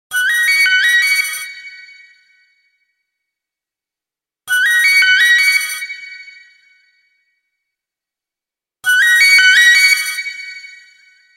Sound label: Do Mi So - Ringtone; Nokia